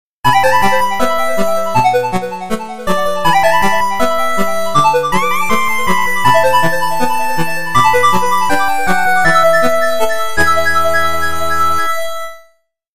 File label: Water Fairy Jingle